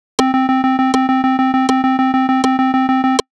Rhythm Note

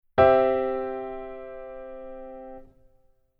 keyboard voicing

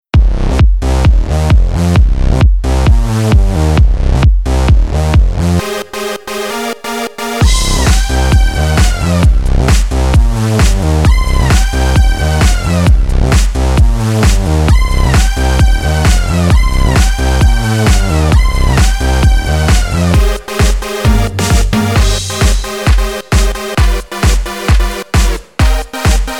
EDM; ABILITY

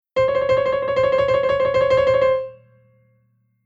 grace note

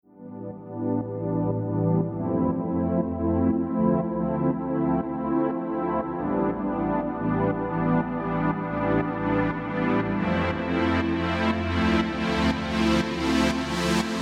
Synth Prog House Pad

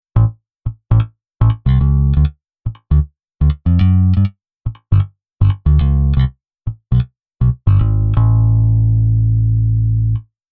Bass Swing

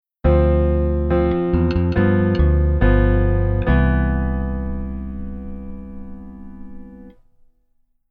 Keyboard Voicing Rootless

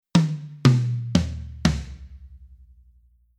Rhythm Funk Tom

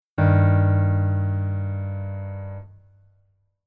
Keyboard Voicing Basic

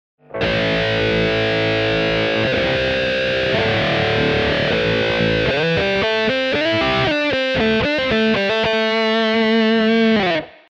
guitar Wah half

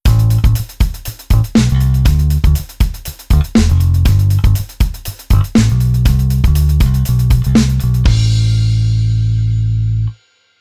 Bass laidback

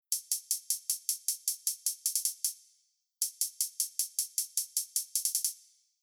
HH Comp On far; EQ Comp Basic

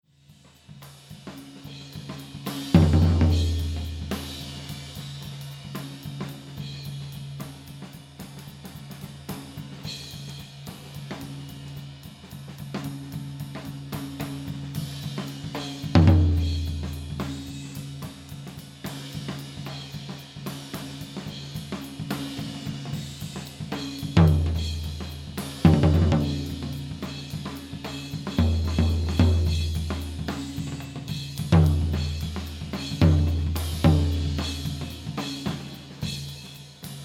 Drums F Tom

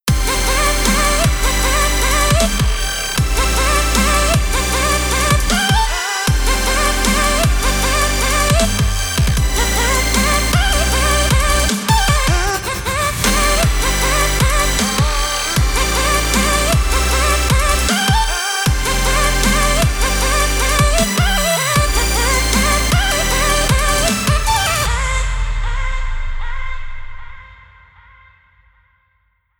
Future Bass Drop; Structure

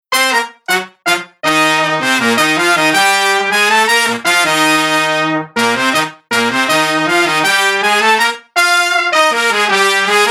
Horn Mix Room Off

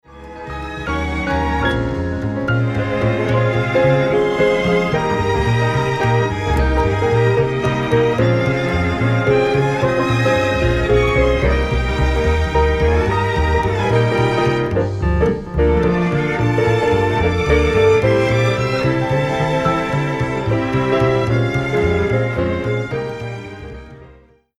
Strings Block Bass On

